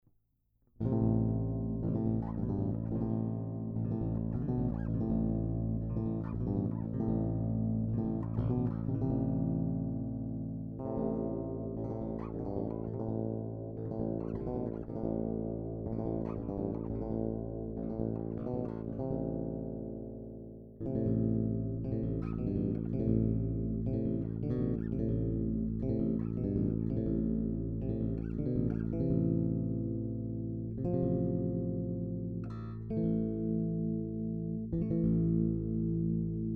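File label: Warwick Streamer Chords Neck Bridge Both; Warwich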